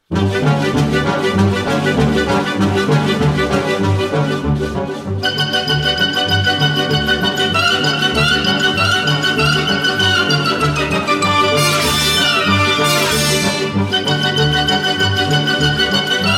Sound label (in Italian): Danza delle spade, Per banda; Sabre Dance; Aram Khachaturian; Versione per banda di Nino Bisceglie; balletto Gayaneh; Simbarrangements